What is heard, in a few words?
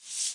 treasure moves